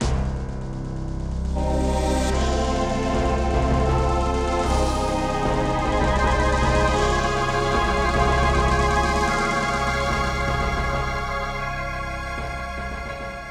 Fanfare, Roland SC 55

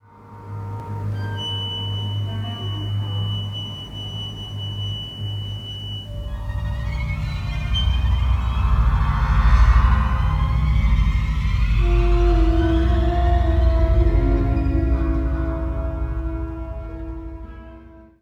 Level Intro; Hoth Trials Probe Droid

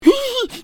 Pig fearful grin; bad piggies; android; mobile; windows

